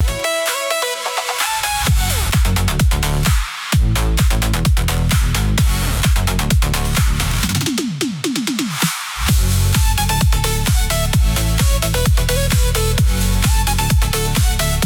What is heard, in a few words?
loop; bgm